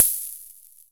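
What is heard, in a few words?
univox open hat, drum machines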